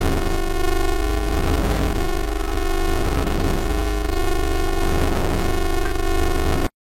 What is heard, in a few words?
jammed; nfs rivals beta audio